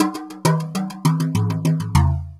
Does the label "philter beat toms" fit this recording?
Yes